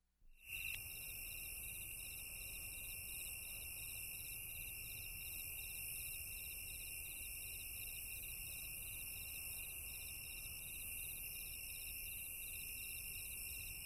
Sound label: Crickets chirping softly at night